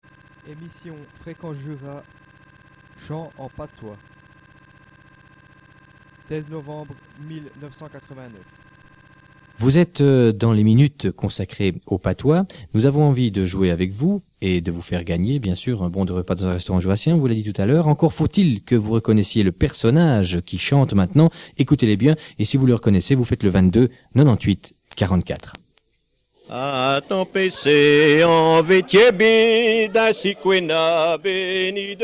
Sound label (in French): Chant patois; Fréquence Jura - Chants